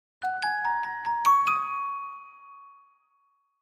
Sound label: Clavichord Chinese